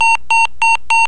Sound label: Page Alert